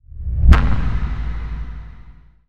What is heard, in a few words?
spaceship woosh; space-exploration 0.5.80